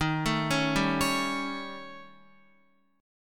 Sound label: Eb 7th Flat 5th, Eb, Guitar; x,6,9,6,7,9; Eb7b5 arpeggiated; JGuitar